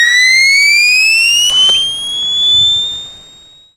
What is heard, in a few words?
detpack timer